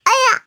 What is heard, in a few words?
combo break